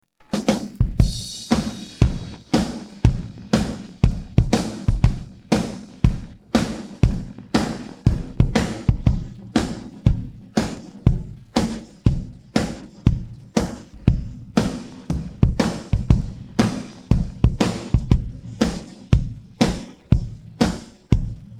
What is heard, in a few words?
drums pattern